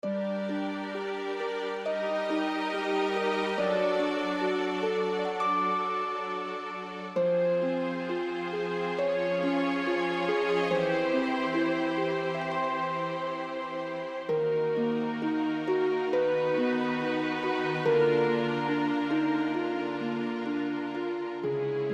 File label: Eerie; Daggerfall arranged